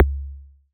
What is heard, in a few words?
KCK, Yamaha TX81Z synth drums